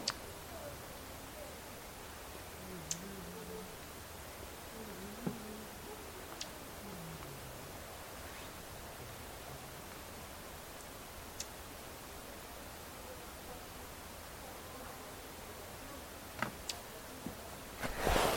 Comesebo contacto, Comesebo Patagónico (Phrygilus patagonicus)

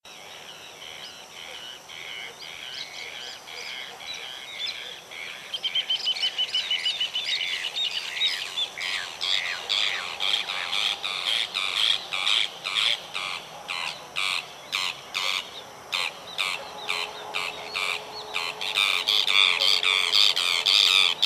Caserotes, desp sin sol; Udine

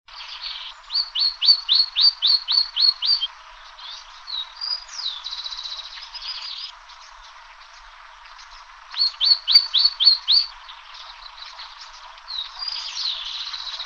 Tacuarita azul

